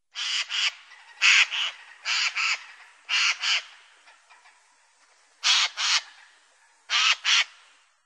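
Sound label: soyka voice, jay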